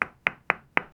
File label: a clear distinct double t, table